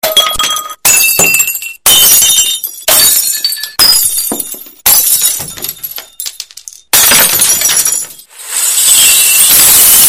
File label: Glass Broken